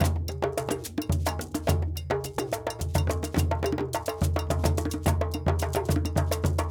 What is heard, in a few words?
Djoun, World Percussion